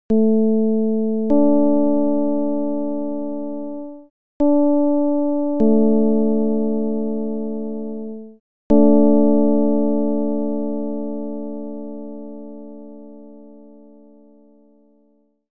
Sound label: csound foscil 220hz